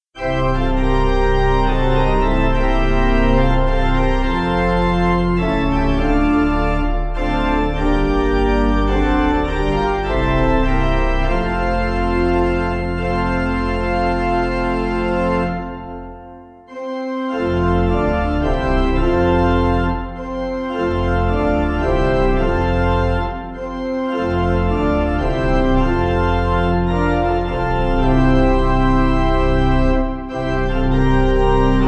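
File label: Pipe, Free Music Backing Files for Churches; Smith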